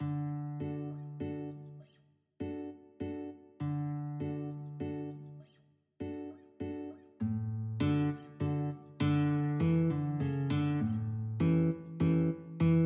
duet, guitar duet

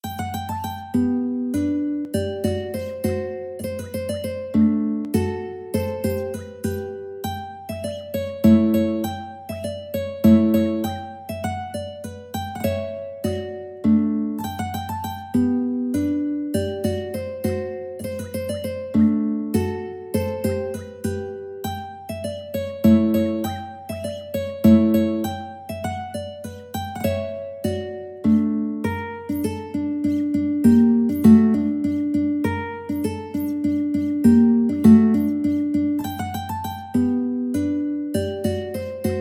Minuet, mandolin; Boccherini

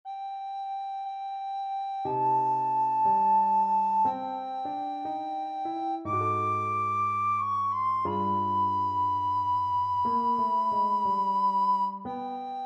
happy jazzy birthday, recorder

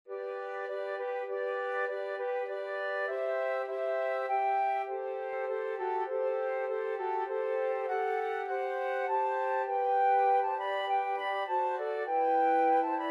flute quartet

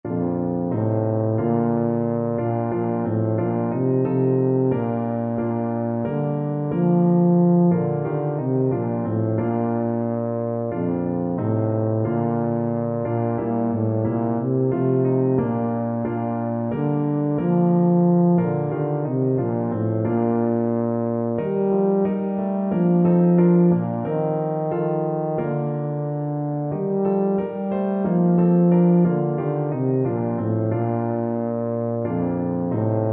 once in royal, tuba

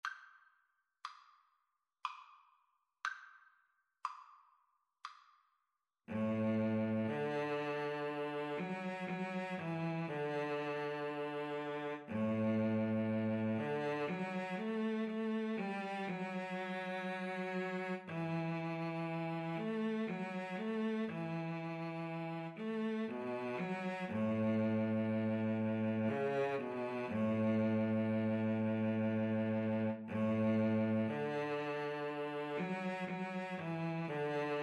Viola Cello Duet

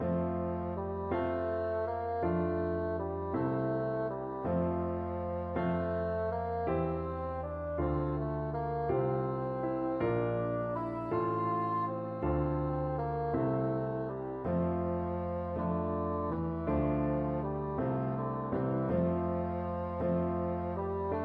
bassoon

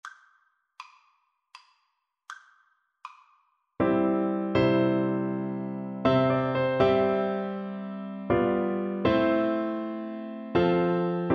amazing grace, Alto Recorder

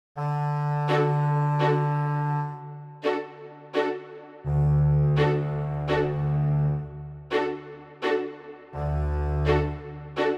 elephant, viola; saint saens

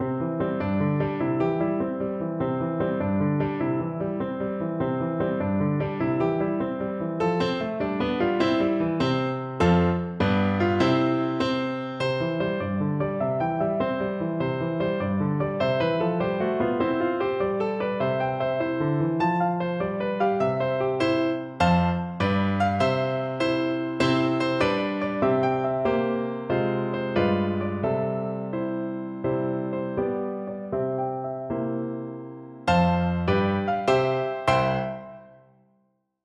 pop goes, piano

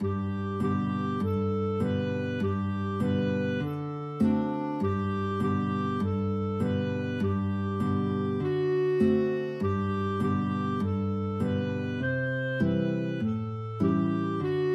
yankee doodle, guitar clarinet duet

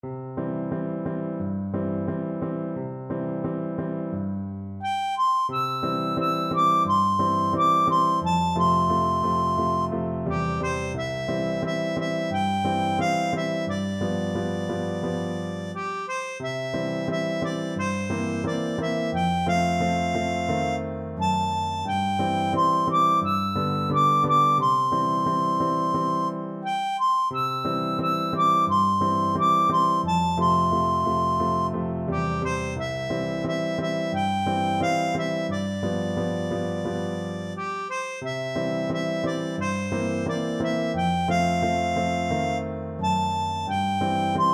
harmonica

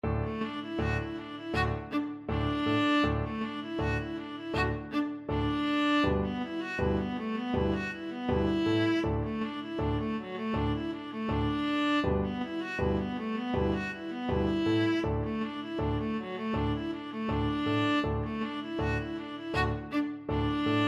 1812, viola

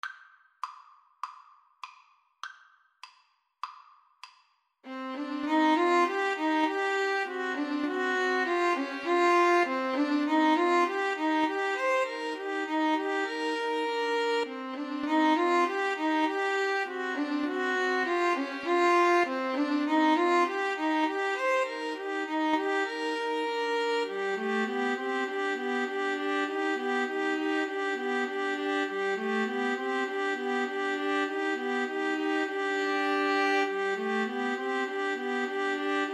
mountain king, String trio

